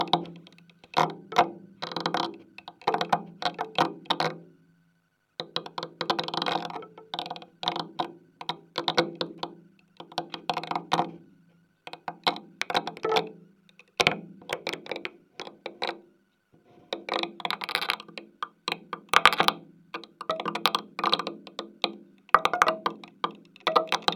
maglevdrummer on cardboard and sticks

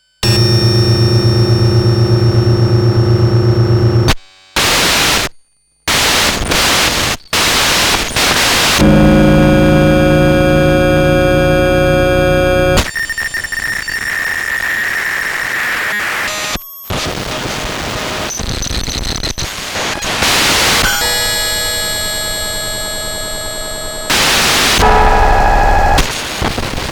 kawai ms20, circuitbending

